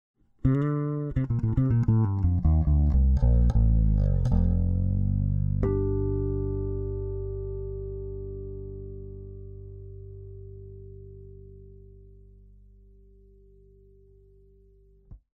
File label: bass soul passiv beide